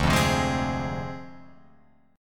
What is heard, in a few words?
D Minor 7th Flat 5th, Guitar Open F; Dm7b5; strummed